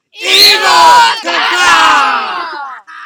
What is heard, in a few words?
yell eagles; patrol eagles